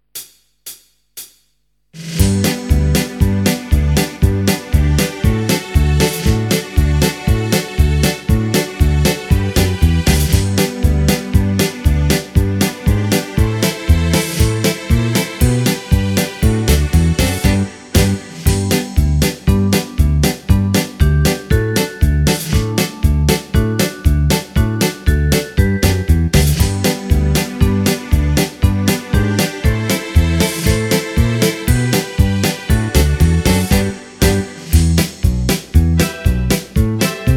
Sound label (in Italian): sirena base